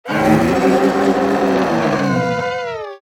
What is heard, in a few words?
Large beast monster pain hit death; fantasy